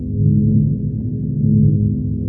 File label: field loop; Garry's Mod; hl2; citadel